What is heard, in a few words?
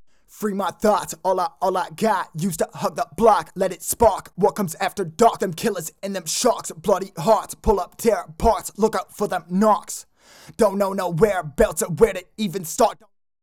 loop vocal main verse 150 E Minor dry